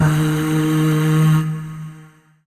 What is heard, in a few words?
Void Shawzin Oct One D Sharp; Warframe; Zariman